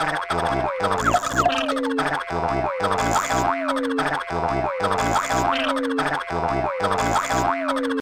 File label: Cartoon; Flesh Tunnels A; LSD Dream Emulator; PS1